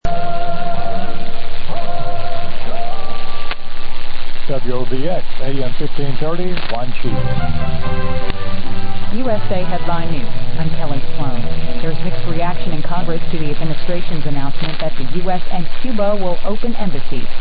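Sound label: chimes; 1530 WOBX Wanchese NC 2100 UTC 7-1-15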